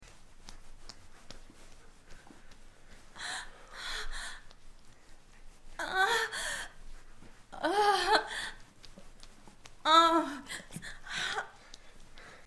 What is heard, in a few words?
moan